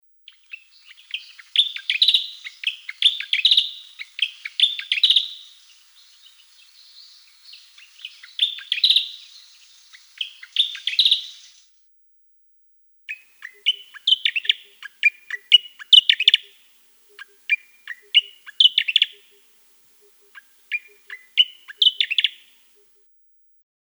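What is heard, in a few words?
Pallas's grasshopper warbler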